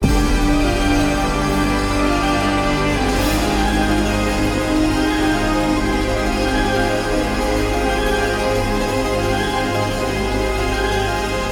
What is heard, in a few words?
big win music; godsofgold